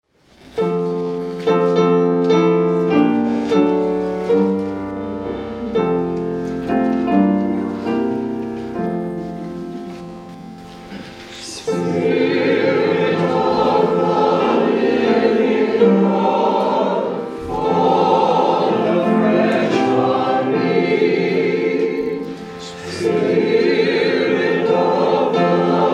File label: Hymn; Spirit of the Living God